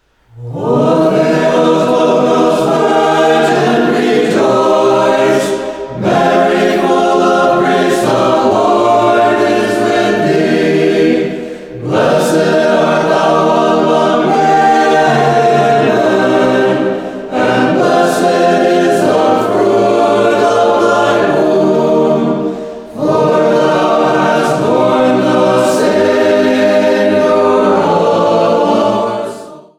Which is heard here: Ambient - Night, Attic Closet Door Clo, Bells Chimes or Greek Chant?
Greek Chant